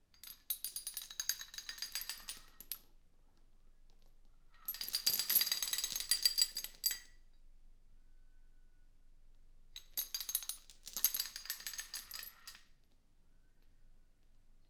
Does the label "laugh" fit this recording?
No